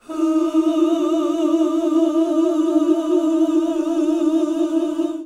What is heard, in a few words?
OOH EB3, GOSPEL; Best Service; Hallelujah; GOSPEL 10